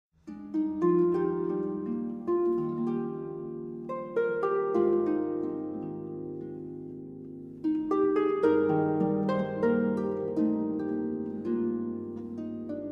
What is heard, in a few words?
Danny Boy Irish Traditional, harpist